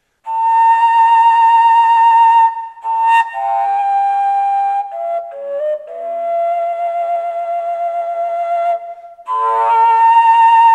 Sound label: a carved, fujara; folkart slovakia